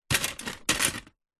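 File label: leisure toy drop into toy box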